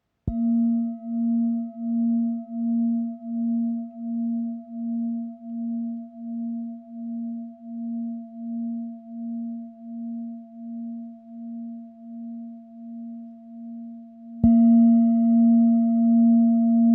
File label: black om